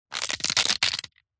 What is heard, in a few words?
horror bone break snap twist or crush; zapsplat